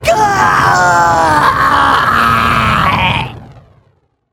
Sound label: VO Igniter Death; battlerite